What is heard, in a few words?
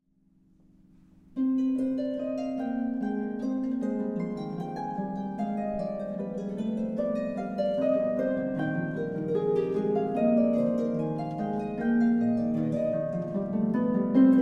Air - French Suite No.2; BWV 813